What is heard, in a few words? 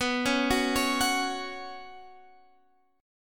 B Suspended 2nd B